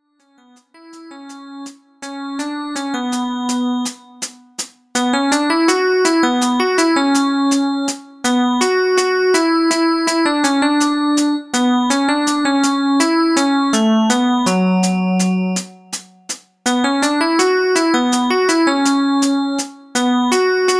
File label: Tobira melody